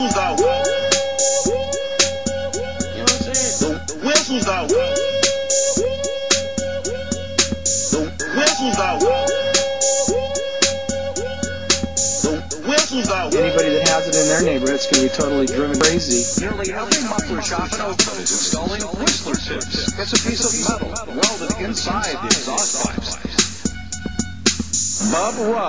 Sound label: whoo